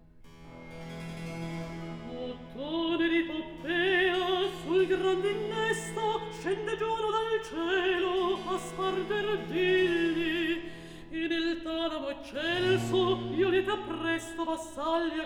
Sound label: Recit; Act 3; D'Ottone e di Poppea; Handel; Agrippina, HWV 6